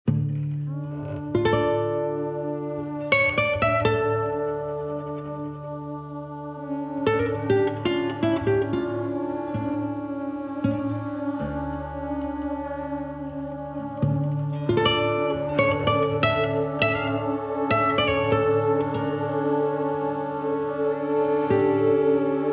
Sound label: Theme Guit Voices Sketch